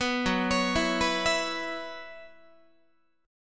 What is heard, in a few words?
Gb 7th Suspended 4th Gb down Guitar, chord